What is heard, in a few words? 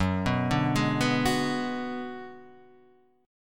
Ab Minor 7th Flat 5th Gb